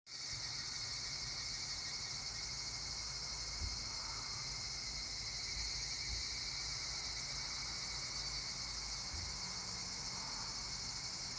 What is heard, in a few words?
cicada